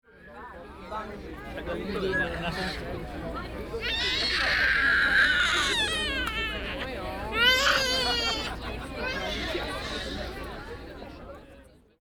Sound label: Charles Bridge Baby; field